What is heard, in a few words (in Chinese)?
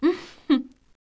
女轻哼笑; renlei